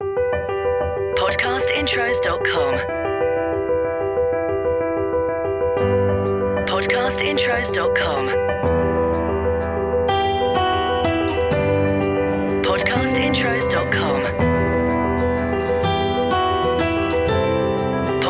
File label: CHILDREN; Podcast Watermark